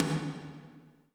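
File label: tom, real mega drums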